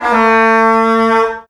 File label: Sax; pitchglitch samples